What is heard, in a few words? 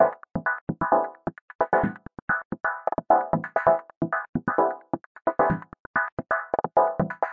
131 rollertechno